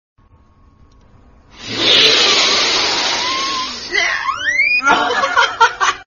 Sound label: Sniffing Candy Cane Meme sound; Fortnite